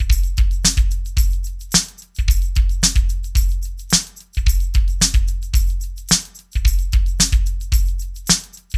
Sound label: DEEP, LOOPS; Best Service; Hyper Dance